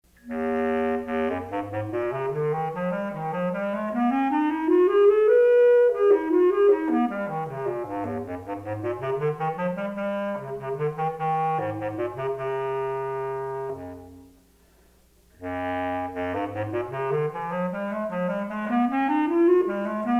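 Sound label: bass clarinet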